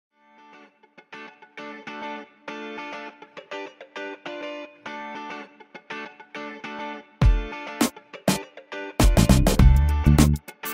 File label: Solo Riff